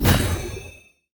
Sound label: heroes basic Attack cast; stregi